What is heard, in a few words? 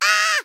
intro scream; Ginger